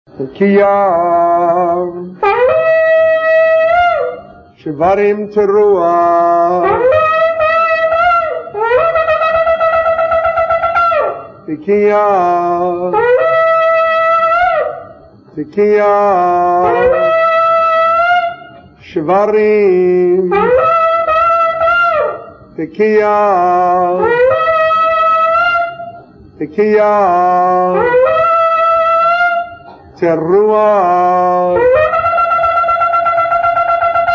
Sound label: Ram Horn, Shofar